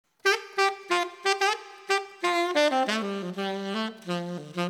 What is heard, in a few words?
G Maj 8beat 90